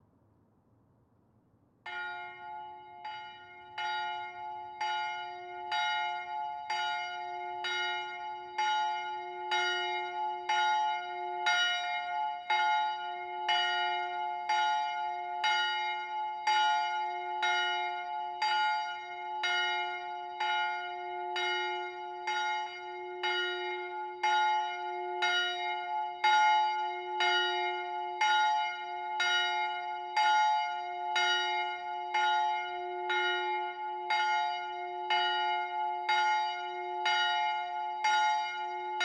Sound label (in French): cloches